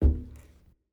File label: Footstep Metal; Silco